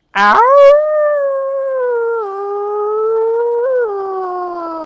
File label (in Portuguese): uivando